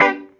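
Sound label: guitar chord G, roots reggae; Sounds Good - Roots Reggae; GTR CHD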